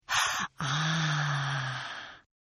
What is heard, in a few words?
Gasp Aaah Female; Gasping; Sound Effects:Voice; Partners In Rhyme